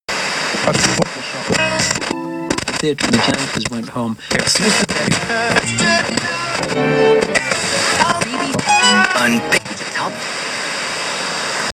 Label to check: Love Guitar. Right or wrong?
Wrong